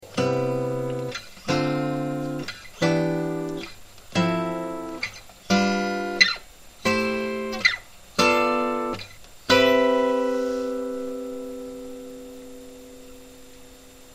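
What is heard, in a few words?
Harmonic Major, guitar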